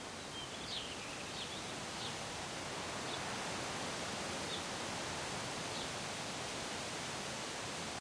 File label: trees